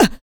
HIT, Jazz FX FEM PERC

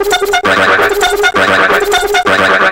CARTOON, Hard & Loud Techno; USB Soundscan vol.01; AKAI

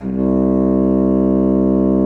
BASSOON C2; Xsample VOL-6

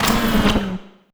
CYBER LIFT, Impacts, Hits & Booms; Distored Reality

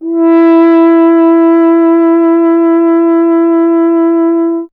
F. Horn

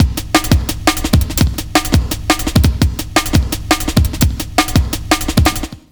LOOP, Jungle

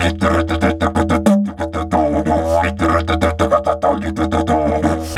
Didge 93 BPM, Didgeridoo

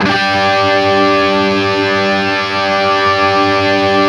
5TH G 3, guitar; East West; Steve Stevens Guitar Samples Collection

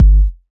Kick, Kickdrum; Equipped Music; Loungin House

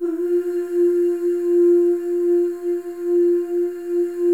OOSC, FEM OOS, Voices

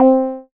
PLK OBXA C4, Oberheim OB-Xa, Synths

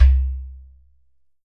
GHATAM

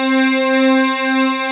RICH WAVE

